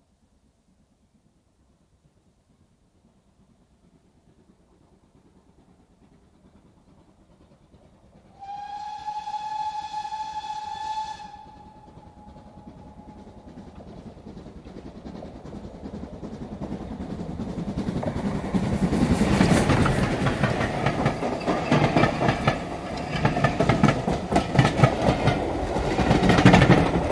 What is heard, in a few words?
Steam Train passes at high speed with whistle, right to left; BBC